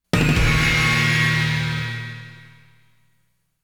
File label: Gong Crash!; Konami Amusement Sounds '93 Autumn